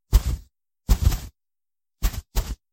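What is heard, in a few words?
elbow push fabric hit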